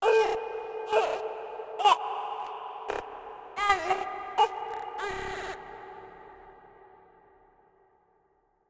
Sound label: Baby Glitch; MiSide